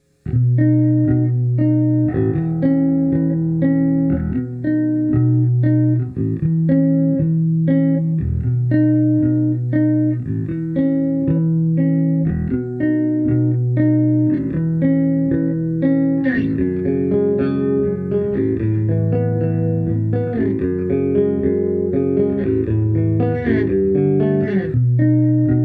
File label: CHORDS ONLY; berg ae112; ae 212 cabs